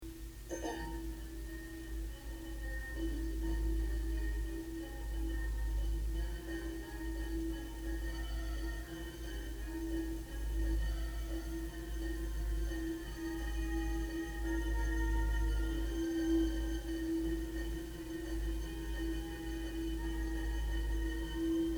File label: lobster